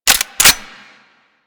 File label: Lever Action Rifle Cock, Weapons; Warframe; Tenno